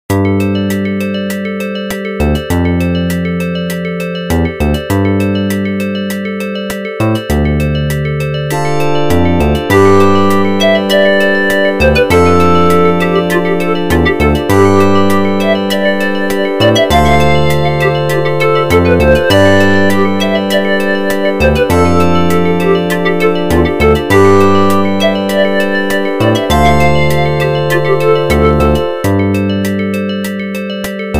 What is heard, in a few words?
Exploration; rayman 3 j2me mobile gamerip 2004